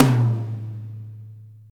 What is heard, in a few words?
Huge Tom Drum One Shot A Key, tom sound tuned to the A note